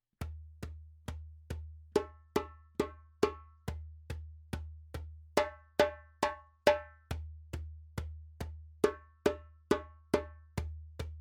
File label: djembe; djembebog oevelse